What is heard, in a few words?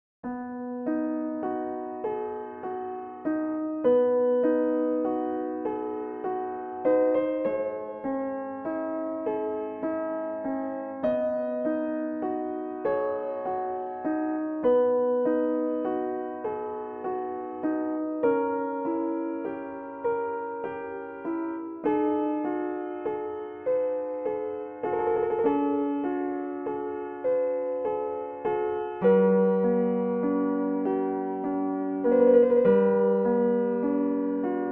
24EDO Solo Piano Miniature